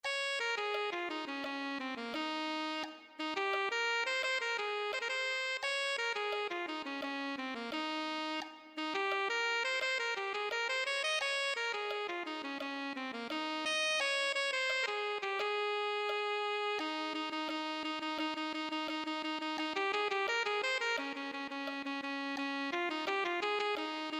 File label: alto saxophone